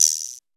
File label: 909 INSECTS; E-MU Producer Series Vol. 2; More Studio Essentials; Composer; Technopourri